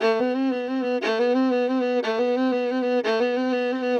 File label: loop test valse fond A